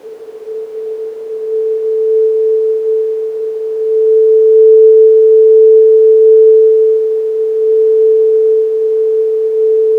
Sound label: noise 440